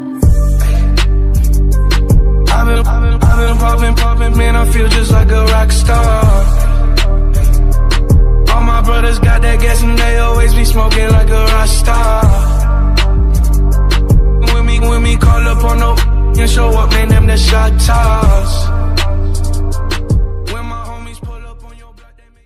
fast beat ringtone

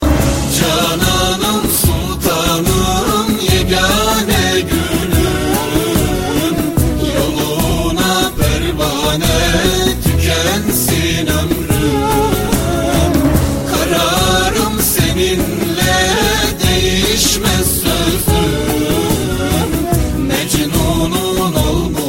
islamic ringtone

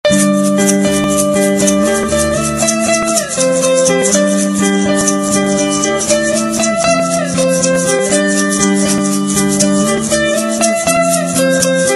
nexus sa sa ring tone ringtone

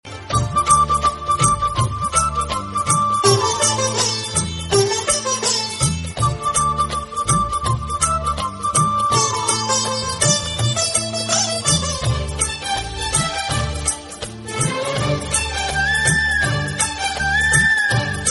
classical flute background music tone ringtone

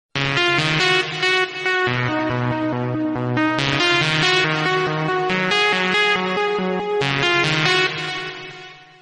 message mobile tones ringtone

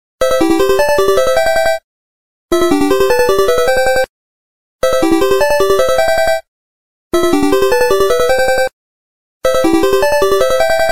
sony original ringtone

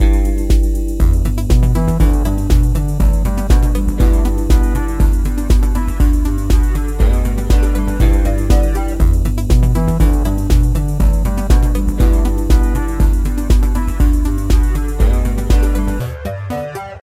calming minimal

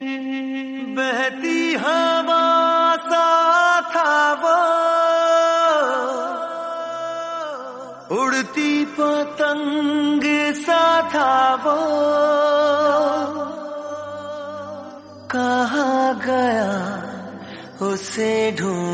bollywood movie song tone ringtone

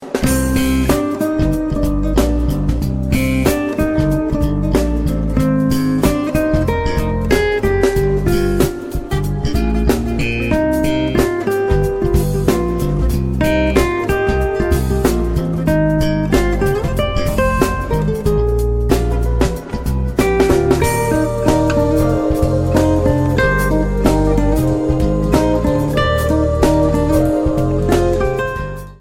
ringtone

